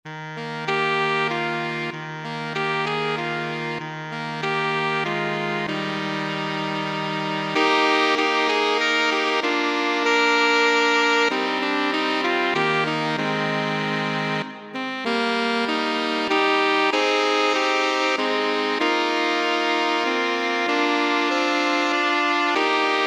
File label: sax Trio; saxophone trio